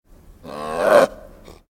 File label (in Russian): dikobraz middle attack